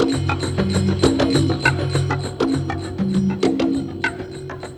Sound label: Ambi Groove